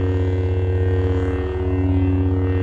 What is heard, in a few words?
saber hum; mouvement dans l'air; Wooonnnnssss